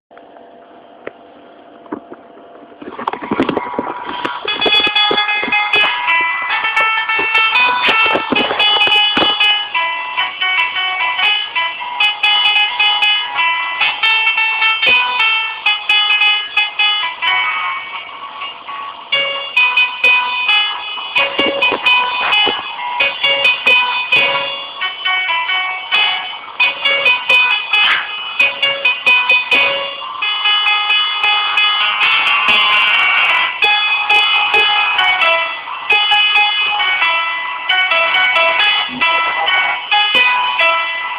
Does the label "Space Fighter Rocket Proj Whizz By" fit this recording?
No